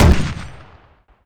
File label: Pipe fire, pipelauncher; weapons